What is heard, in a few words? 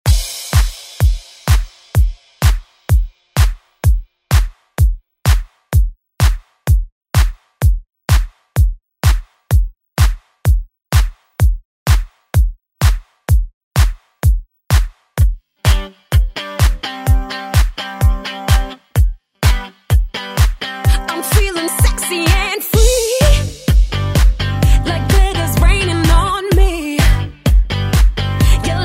Re Drum; DJ Mhark